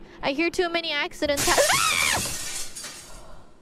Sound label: Scream I hear too many accident; IRyScream